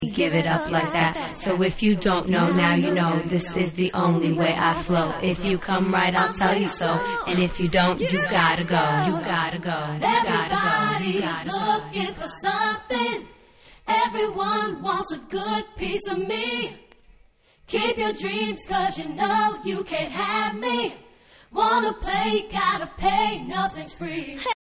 Acapella

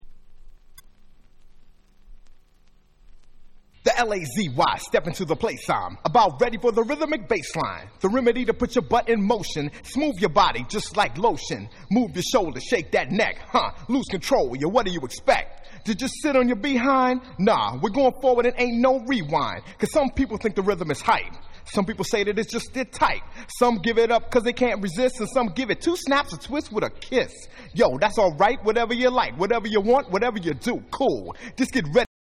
Accappella

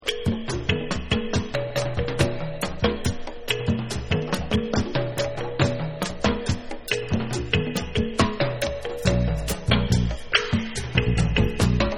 DRUM SONG

